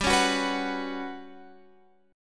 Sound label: G Major 7th Flat 5th, Guitar Open F; GM7b5; strummed; x,14,13,13,14,13